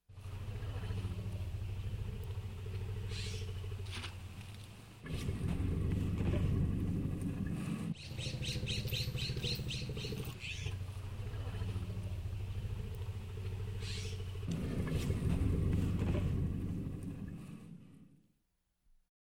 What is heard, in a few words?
Threatening nature ambience